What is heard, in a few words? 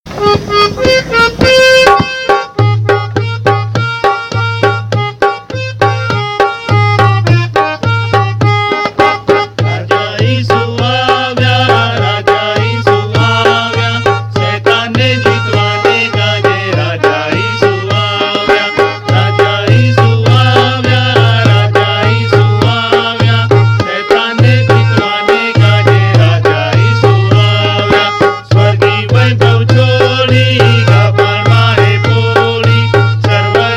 Traditional Tune; 502 Raja Isu Avya